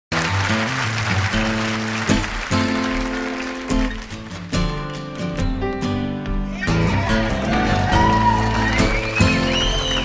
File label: Acoustic, Live